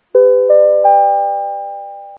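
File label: Pre Announce Chime Ascending Tri Tone; Routine; PAGASYS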